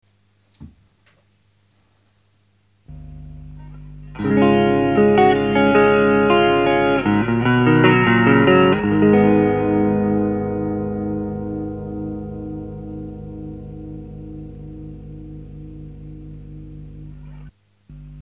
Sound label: H2O Liquid Chorus & Echo, CLEAN CHORUS; Visual Sound; SPEED5 WIDTH3 D．TIME4; デジタルっぽさが無い、澄んだエコーが得られる。